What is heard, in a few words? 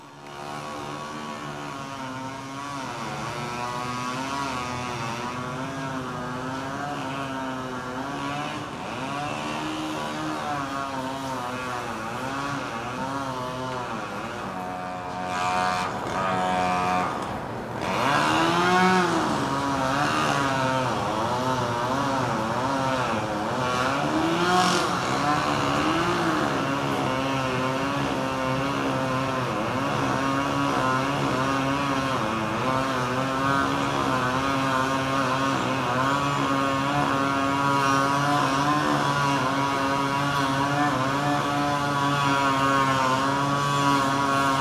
Chainsaw